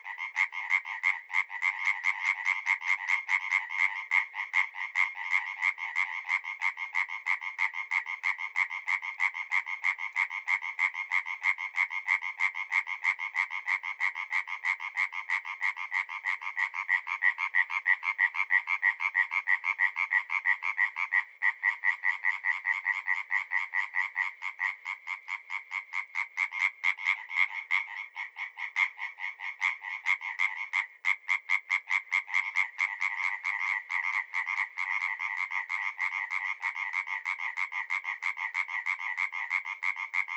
common tree frog hyla arborea; lyagushek i zhab

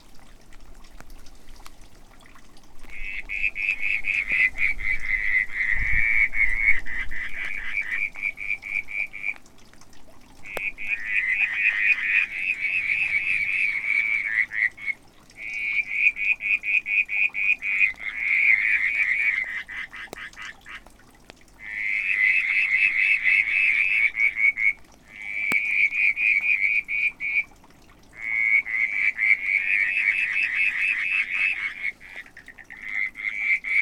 whistling tree frog litoria ewingii; lyagushek i zhab

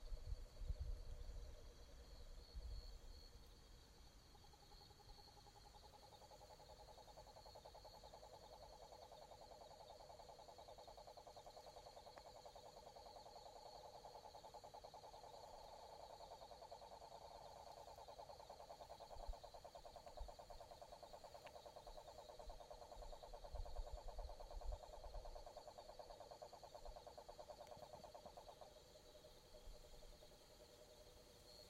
marine toad rhinella marina; lyagushek i zhab

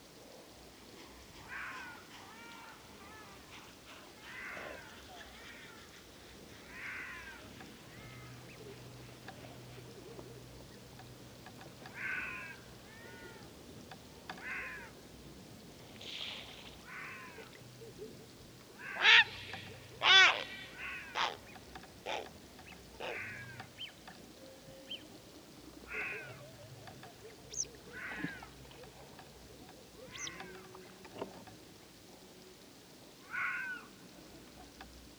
corsac fox vulpes corsac; lisy

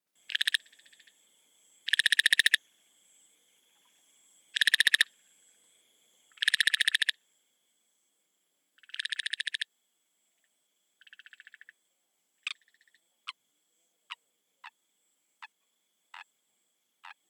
hylarana leptoglossa; lyagushek i zhab

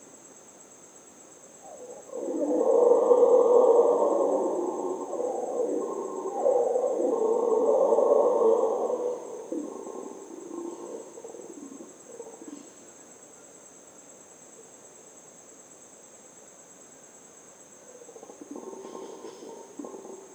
colombian red howler alouatta seniculus; revuna obezyany

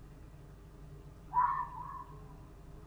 red fox vulpes vulpes; lisy

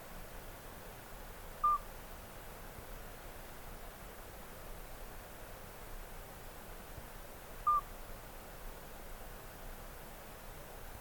midwife toad alytes obstetricans; lyagushek i zhab

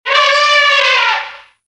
elephant; slona

